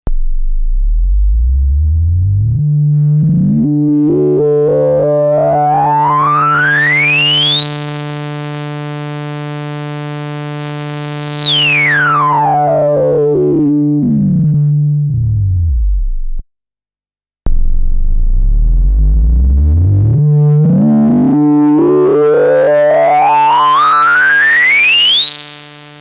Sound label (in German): Filter Resonanz